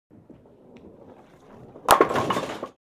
BOWLIN A

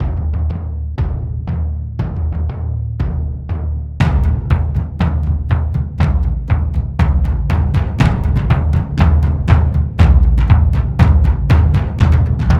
COMPLEX 120 bpm

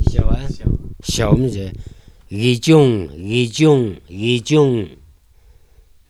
laugh

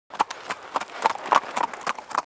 Horse Trot

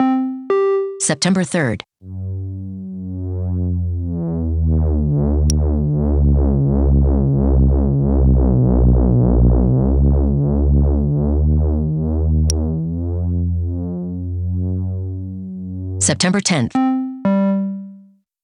wave sonification; Hermine Update Tk4B

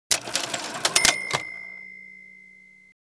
REGISTER

